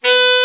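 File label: alto, sax; Best Service Gigapack 1; BR SAX 1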